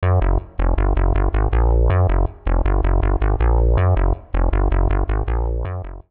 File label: electro rhythm seq